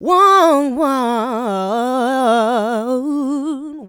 Vocals, Adlibs; Houseworx

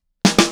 Drums Intro, Indie Rock; Sonic Foundry (Sony Creative Software); Indie Rock Anthems; Session 01 - Brighton

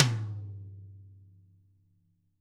DW HIT FD, Double Platinum Drums; ILIO

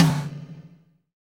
TOM TOM, Roland

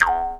E JAW, Primitive Instruments; Big Fish Audio - Didgeridoo and Other Primitive Instruments; JAW SIMPLE

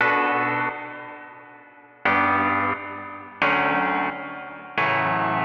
Taped 88BPM B min, Pianos; Instrument Loops; 99Sounds; Monosounds

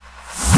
fx reverse; Ueberschall; Techno Trance Essentials

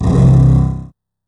DOUBLEBASS; ILIO; Kirk Hunter Virtuoso Strings VOL-2; 90 s Sample CDs; 5DOUBLEBASS

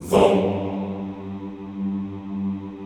VOHMMM G1, Classical Choir; Best Service; Extended Classical Choir

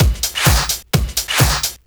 Clubhouse Loop 128 BPM

